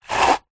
paper shredder manual handle turn single shred paper; office